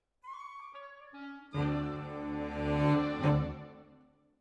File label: Cello Solo